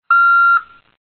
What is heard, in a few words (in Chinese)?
daoche; Truck